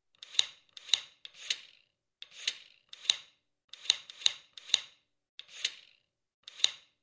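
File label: Abacus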